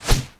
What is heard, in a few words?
Quick Whoosh